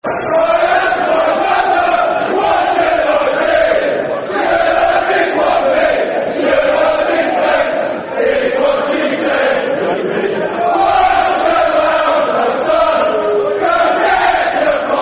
When I Was Just A Little Boy Football Chant (Soccer Song); Southampton Sfc